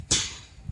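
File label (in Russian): ударный стальной брус при строительстве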